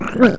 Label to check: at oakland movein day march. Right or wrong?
Wrong